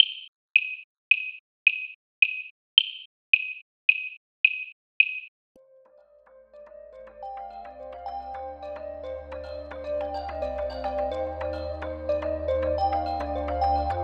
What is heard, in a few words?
intro click only